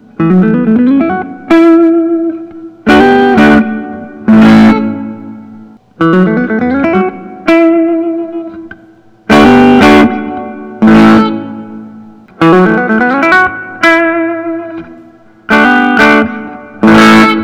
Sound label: gibson custom sg standard bohemia spice